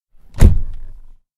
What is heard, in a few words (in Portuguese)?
porta fechando